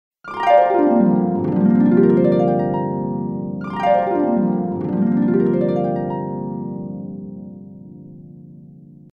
zvuk arfy

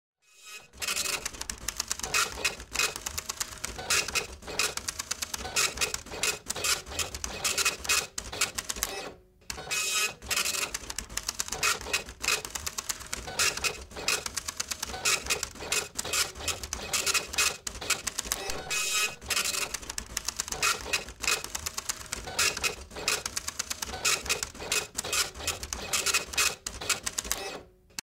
pechatn kseroks

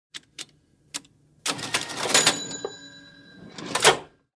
pechat i otkrytie kassy

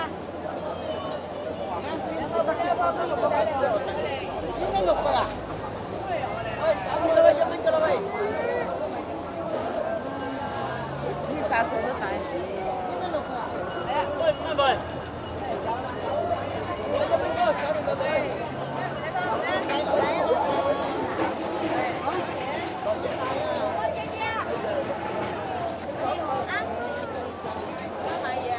rynok

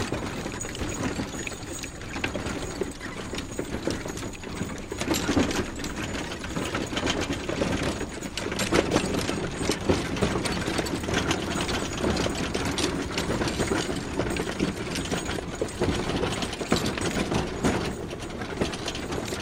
konnaia povozka

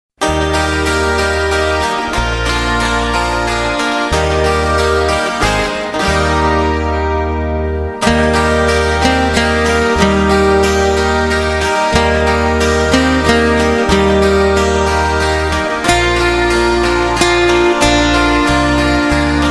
rojdestvenmuz

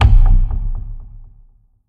skrimery; Звуки ужаса или для создания эффекта чего-то ужасного

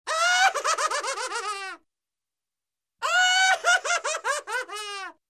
mujskoj komichnyi smeh

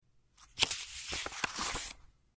zvuk odnoy stranicy

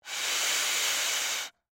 pianyi chelovek duet v alkotester; zvuk pianogo cheloveka

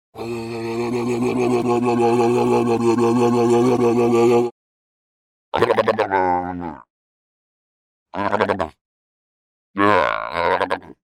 morskoj lev

